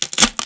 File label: stone shooter move; free 2 play